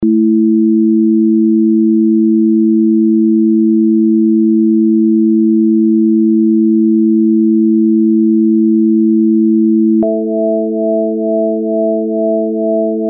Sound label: beats